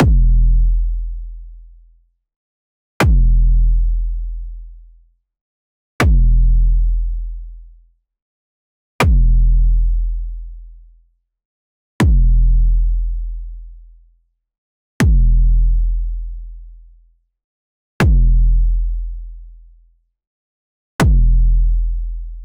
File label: kick with wa, club